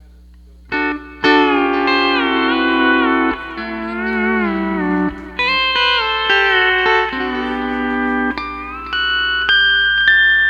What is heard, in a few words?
1st String